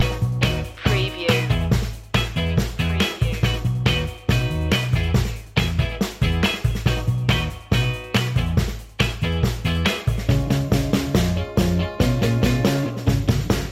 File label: old school detroit loop